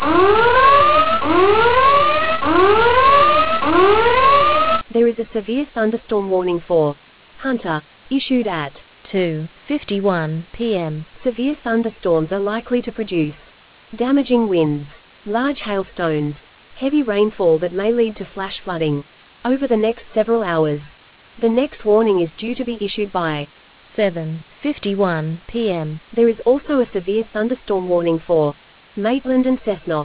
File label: storm audio